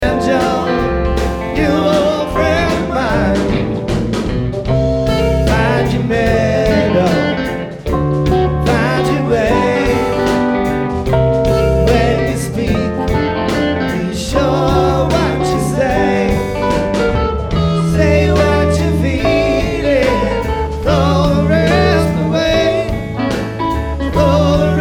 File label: Banjo